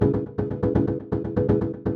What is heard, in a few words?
122 BPM Sequence Amaj Fmin Tonic C; innovative sequences